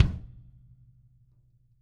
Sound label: KICK, Drum Kits